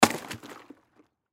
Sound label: Ice Pond Frozen Bucket Fall In Hole Through Ice Break; Weather; Ice Sound Effects; Part of Ice (Sound Effects:Weather); Blastwave